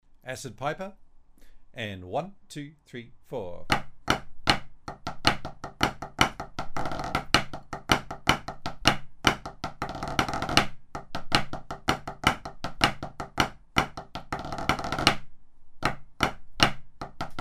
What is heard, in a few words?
Acid Piper